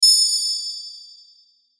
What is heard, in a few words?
Hit Chimes Ring, Hit Chimes Ring High; Production Element Imaging Element Accent Transition